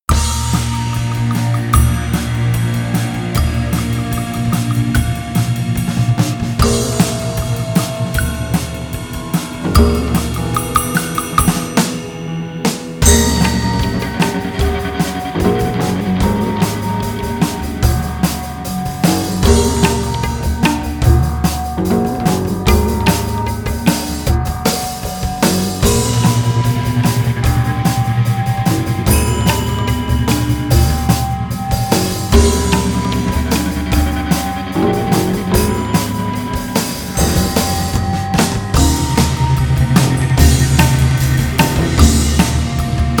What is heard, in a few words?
Temporate Rain